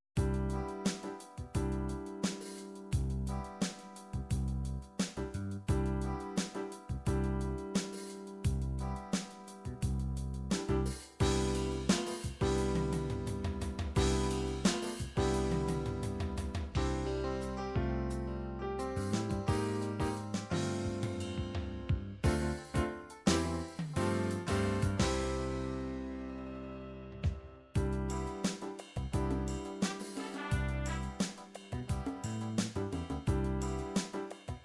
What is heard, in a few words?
16 beat, midi